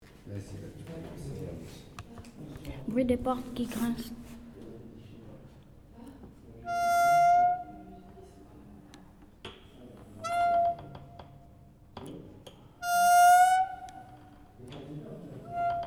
porte grince

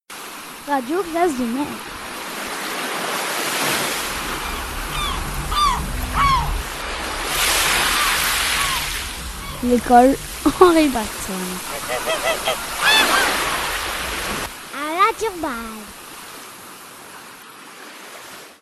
Jingle Radio; classe de mer

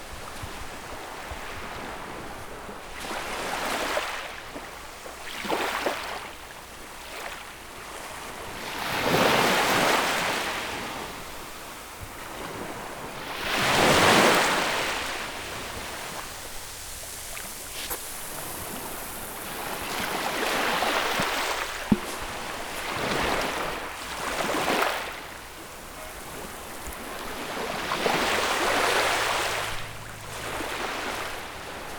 vagues

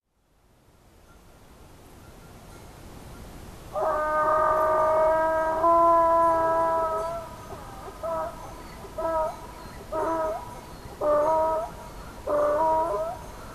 âne; MZ-N710

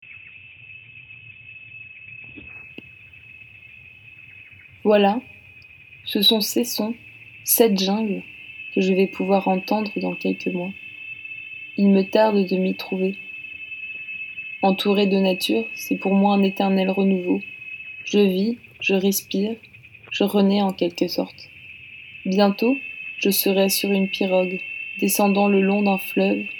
carte postale sonore

